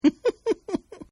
Giggle Male; Laughter; Partners In Rhyme

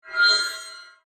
Gear Movement Alien, Metal, Squeak, Device; Robot; BlastWave

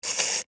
Electric Timer Turn, Dial, Clicks, Antique; Technology; Miscellaneous Electronic Sound Effects; BlastWave

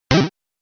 Bouncy Bleep; Beep Sound Effects; object sound effects

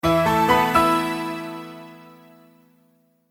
multimedia logo, Musical Identity/Signature; Sonic Logos; Sound Design; Short Musical Signature / Ident

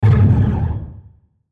Hit Warble Dark Eerie; Warbles; Blastwave

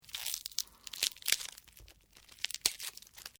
LIVE DRY FLAKING OFF, Organic Foley sounds; Injuries; Combat

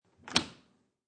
A dishwasher being shut.; Close Dishwasher; Kitchen; Household

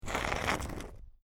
Stone Grind Scrape Along Stone Pillar; A rock scrapes against even rocky surface; Rock Sound Effects

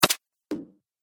Archery - Elf Bow Hits Target; Bow And Arrow Sound Effects

